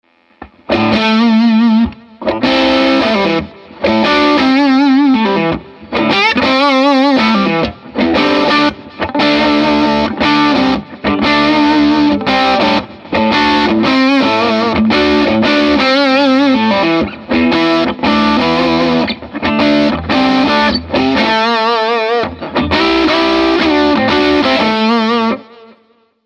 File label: el84 click best, guitar